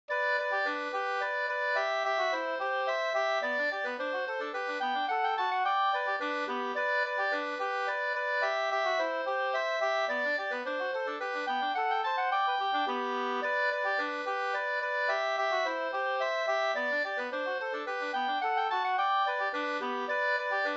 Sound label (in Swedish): slangpolska 2st